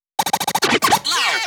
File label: Vocal Scratches 140BPM